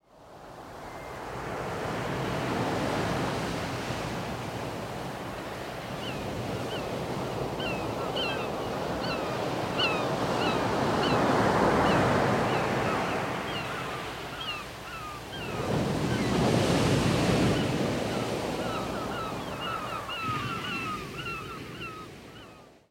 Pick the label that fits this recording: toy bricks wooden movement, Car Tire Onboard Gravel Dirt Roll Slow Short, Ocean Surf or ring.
Ocean Surf